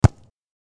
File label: football kick; hollywoodedge